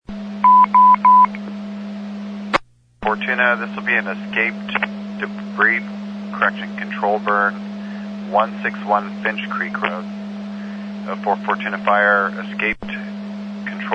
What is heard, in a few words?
Scanner